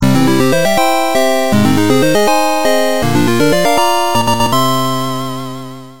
fanfare; mario

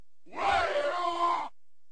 Yell; Punisher Arcade

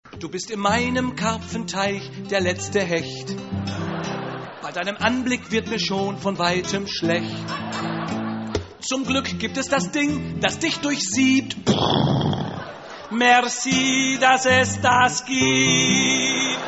Is Calling Ska, ringtones

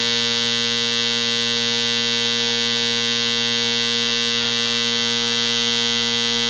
speaker signal

